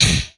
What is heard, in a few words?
Gun; MMRetroArcadeSoundsPack1 0 5